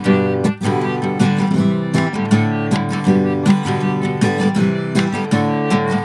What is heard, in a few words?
GTR6, Celtic Flavours; USB Soundscan vol.42; AKAI